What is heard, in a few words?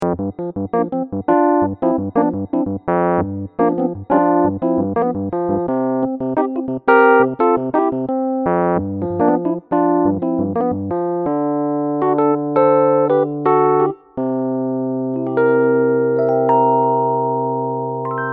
Rhodes